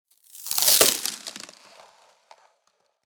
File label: Ice spell frost freeze hit; gfxsounds